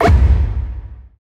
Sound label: countdown two; fallguys